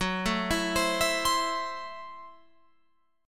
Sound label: Gb Minor 7th Flat 5th, Guitar Open F; Gbm7b5; arpeggiated; 18,16,16,19,19,19